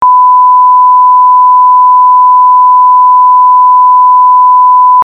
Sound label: Tone